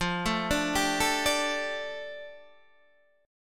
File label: F 6th Add 9th F down Guitar DADGAD, chord